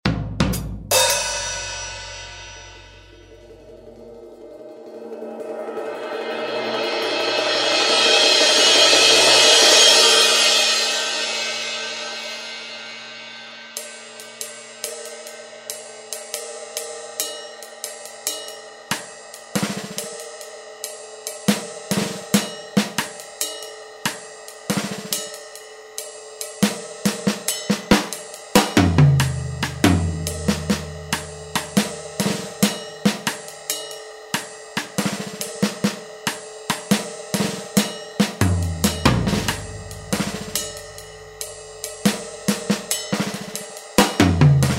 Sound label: jazz